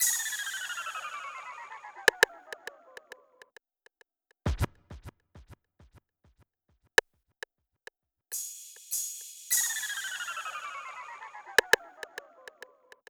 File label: FX & Percussion, 101 BPM D Min; Cymatics; Blue Faces